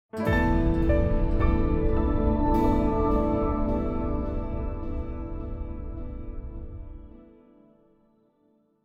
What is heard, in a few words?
contemplative; Joe's Diner